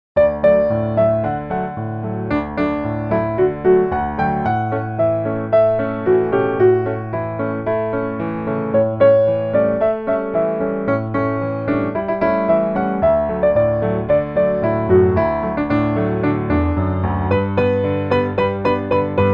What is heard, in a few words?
piano